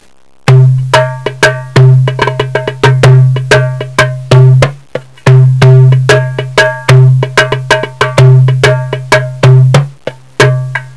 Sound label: instrument darbouka ceramic egypt